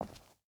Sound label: footstep hide concrete walk; Rust